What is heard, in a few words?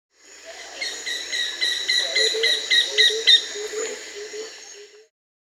Red-stained Woodpecker (Veniliornis affinis), Peru; S. America